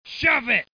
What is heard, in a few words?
yell